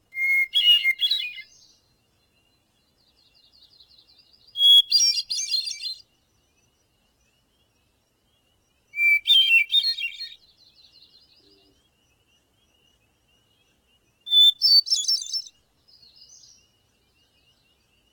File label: hermit thrush; alaska